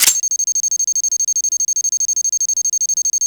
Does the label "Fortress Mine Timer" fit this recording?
Yes